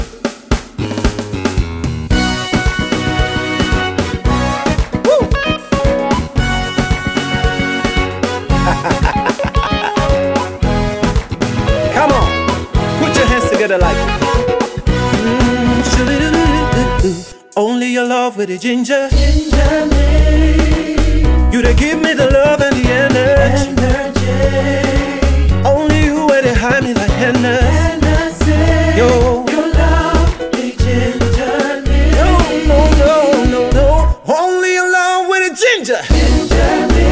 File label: Oriental Choir Cover